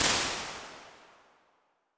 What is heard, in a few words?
Medium Sized Cave, Impulses